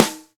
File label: Tight Snare